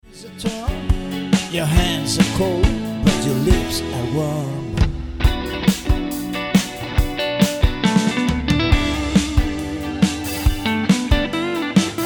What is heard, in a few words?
Bass